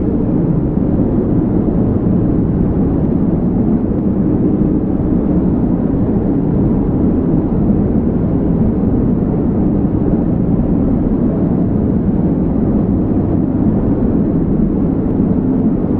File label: wind blowing